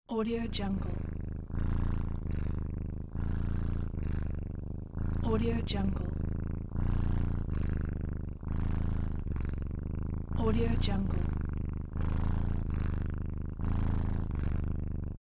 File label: cat purrs; soberman